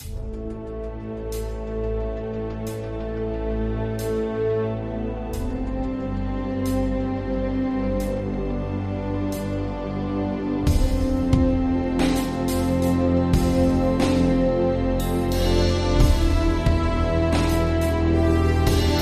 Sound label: Alt Percussion